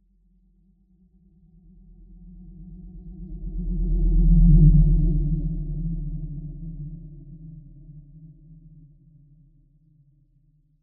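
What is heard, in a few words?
spacecraft or other vehicle pass by left right hum; science fiction; zapsplat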